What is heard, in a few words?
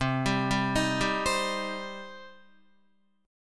C Minor C down Guitar; arpeggiated; JGuitar